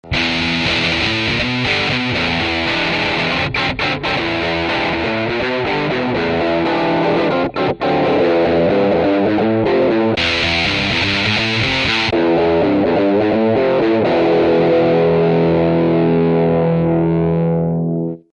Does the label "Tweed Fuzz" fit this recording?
Yes